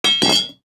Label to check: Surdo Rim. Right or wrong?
Wrong